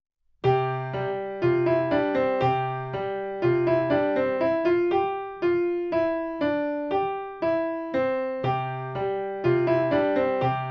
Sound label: Musette